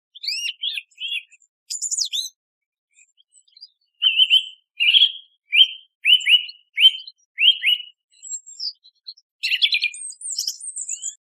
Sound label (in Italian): Tordo bottaccio lungo, birdsound